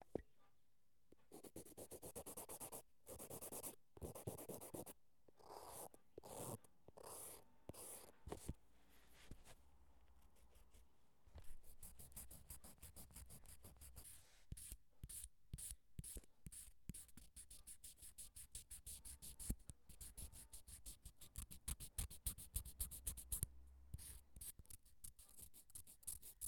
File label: Nail File